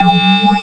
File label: galaxial bell, cartoon sfx